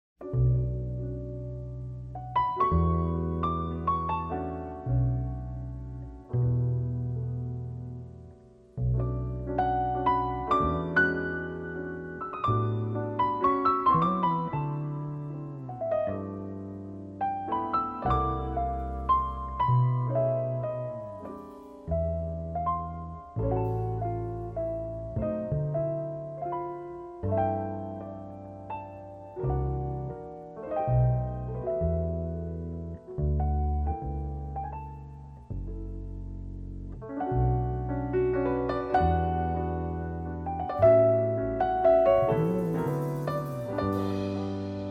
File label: B Minor Waltz